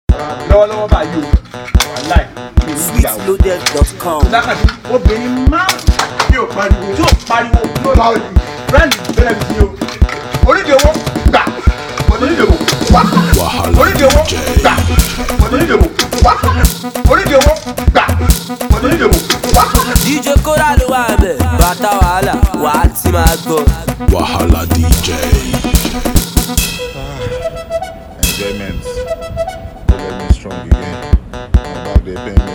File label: Cruise Beat